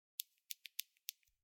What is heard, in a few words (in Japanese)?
スマホの音量ボタンの音